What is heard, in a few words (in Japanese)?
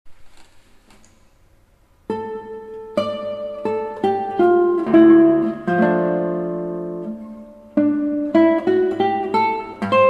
エンデチャ, ギター; エンデチャ、マリンで